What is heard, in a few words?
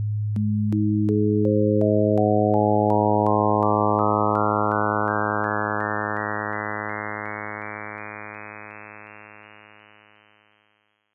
overtones